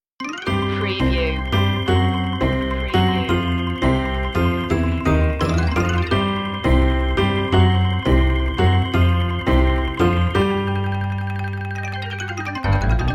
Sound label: no perc